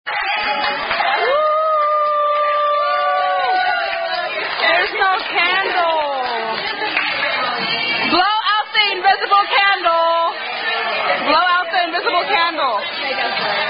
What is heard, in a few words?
Happy Happy Birthday; Red Robin